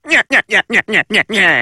Giggle; Peter Griffin

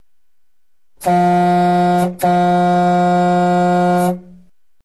Horn

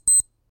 Single Beep Casio

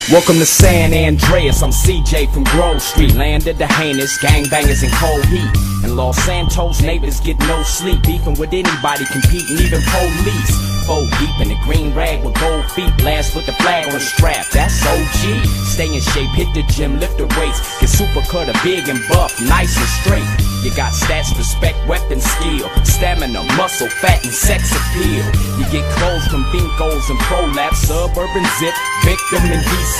Rap; CJ Theme

